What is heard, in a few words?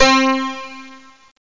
chorus piano, keyboards; Zoom - Release 2; Active Software